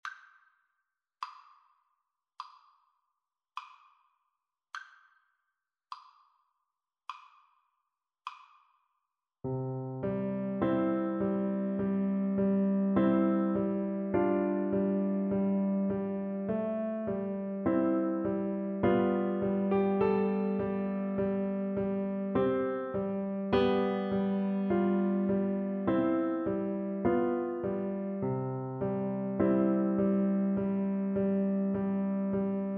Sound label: raindrop, Recorder